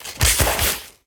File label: Bodyfall Concrete; SFX Kit; Bodyfalls